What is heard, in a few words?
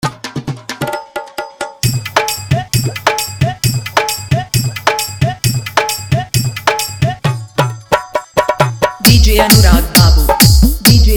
Bhojpuri Dj Song Hard Bass